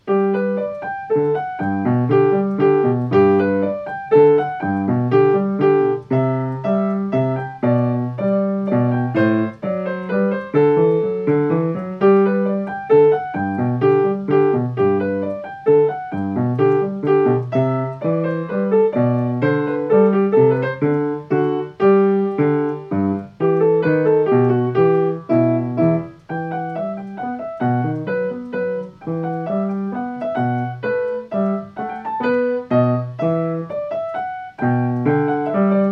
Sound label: minuet G Major arpeggio